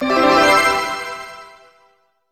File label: Level Up!; Spectrobe